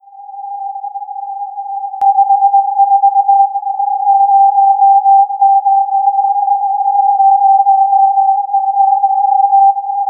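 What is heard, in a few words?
STARS C, satellite; AIRSPY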